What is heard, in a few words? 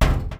Rollover; Rollover Brig; Among Us